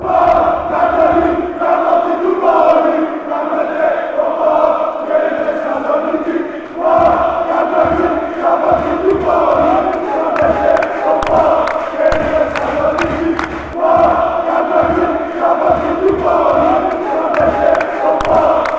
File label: olympiacos chant; ofc